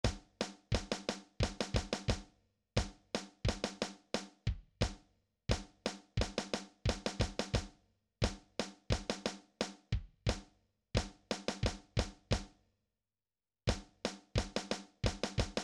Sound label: Eb Major Scale, drums; Drum Recordings